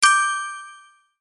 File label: gift pickup; fortressblast2; gifthunt